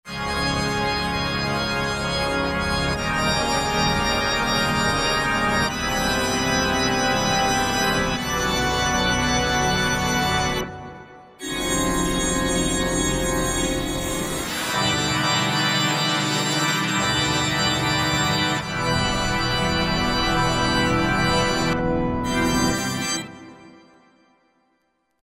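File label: Cathedral Pipe; Roland V-Synth; Factory Patches; 269 CathedrlPipe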